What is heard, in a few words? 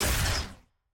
aah; naafiri